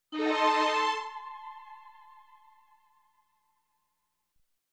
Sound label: Short suspensful strings sound